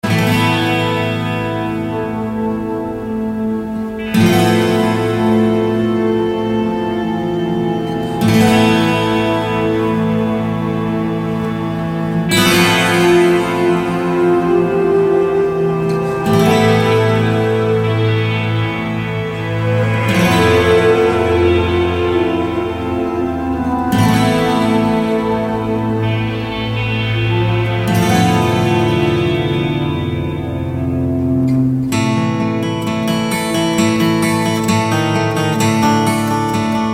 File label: Tristram Guitar (Orchestra); Diablo Anniversary